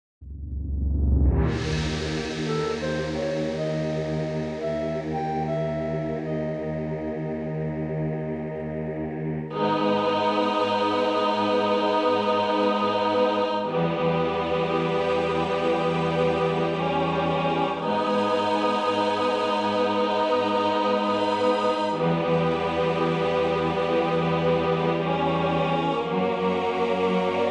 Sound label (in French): musique égyptienne avec ambiance pharaonique